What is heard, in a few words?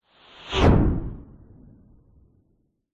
science fiction craft race past zoom ultra fast; zapsplat